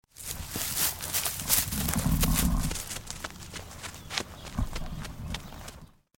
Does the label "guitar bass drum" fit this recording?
No